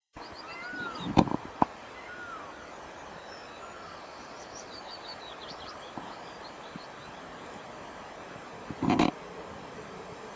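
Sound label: explosive mew, honey buzzard; south tyne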